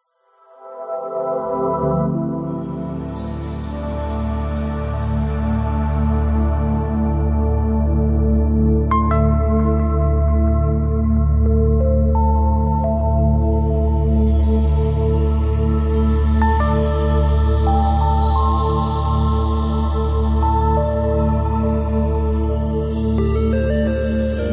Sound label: Redforest Nodrumsnobass; Epitasis